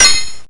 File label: Menu Choose Char; Afterbern Democralypse; Windows